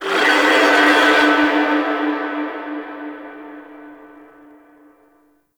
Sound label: Fortress Alarm Texture; Warframe; Ambience; Grineer Fortress